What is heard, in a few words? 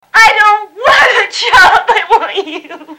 Whining Mistress